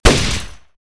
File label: pkm fire; STALKER